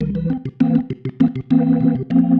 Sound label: Pattern, Organ